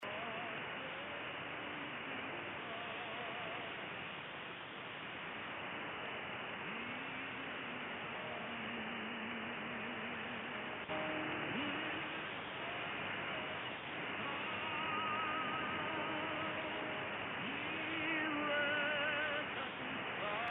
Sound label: WYFR Family Radio 17545khz